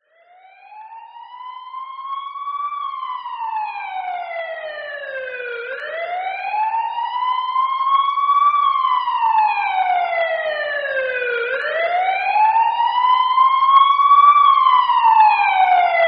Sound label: Tennis Crowd